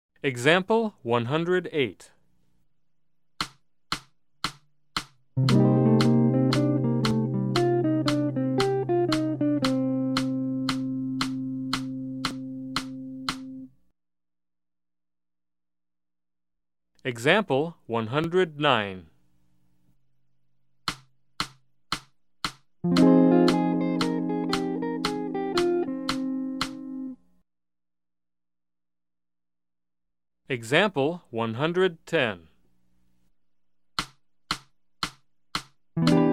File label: Jazz